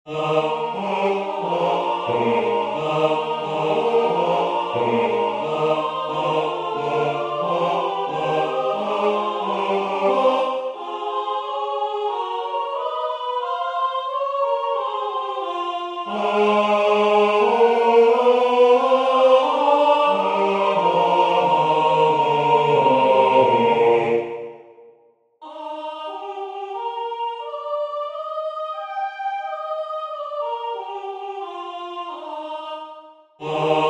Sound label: V Dobrym sme se sesli Bas; A.Dvorak